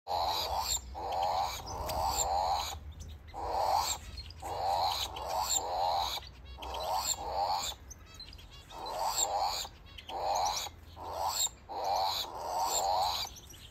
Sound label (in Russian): turako v kletke